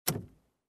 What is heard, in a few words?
Compartment door close